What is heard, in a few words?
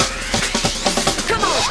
Fills, Loops 140 BPM